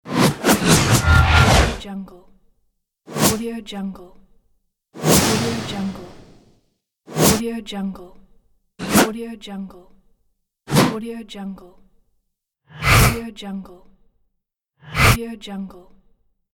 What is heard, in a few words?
swoosh pack